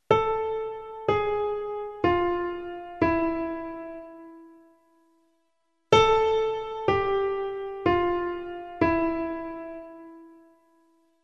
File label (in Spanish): armonica melodica